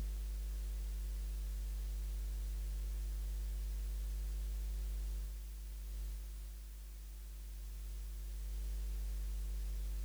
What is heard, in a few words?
Electric guitar 2nd verse 2nd chorus; REMIX KIT